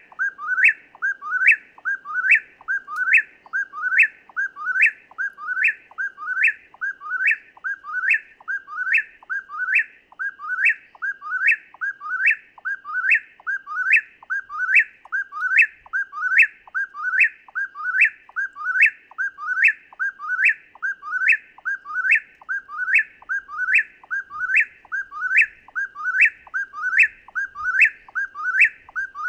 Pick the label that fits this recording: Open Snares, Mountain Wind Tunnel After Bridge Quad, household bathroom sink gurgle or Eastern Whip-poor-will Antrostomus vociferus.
Eastern Whip-poor-will Antrostomus vociferus